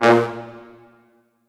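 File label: Orchestral Brass, A sharp 1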